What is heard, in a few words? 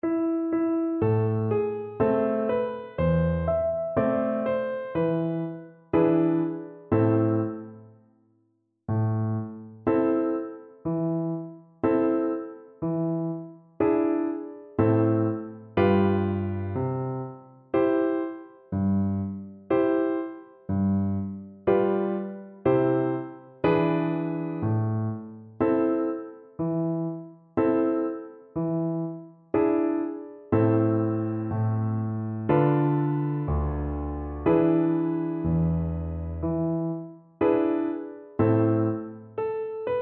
Recorder